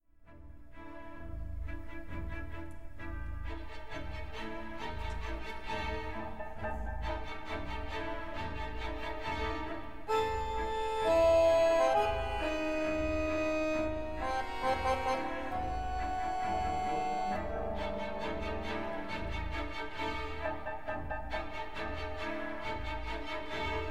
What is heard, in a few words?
accordion